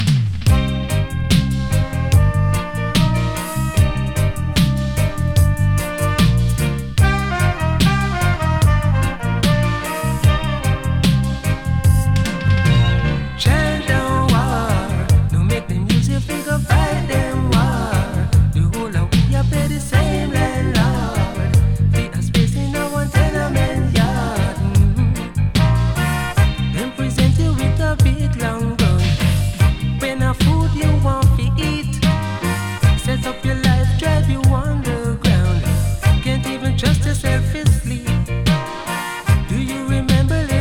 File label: chant down war